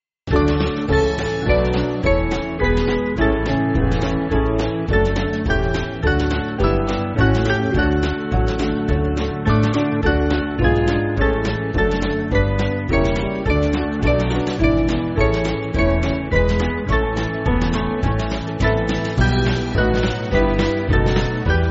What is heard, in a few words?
Band, Backing Files for Churches; Fairfield